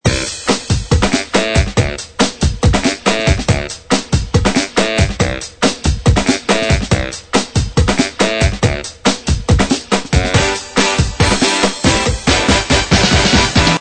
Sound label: Birthday Party Loop